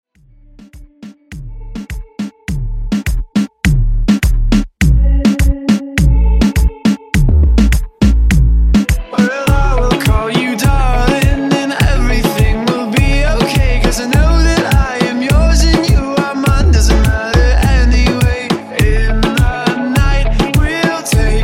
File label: Top40 Reggaeton Mashup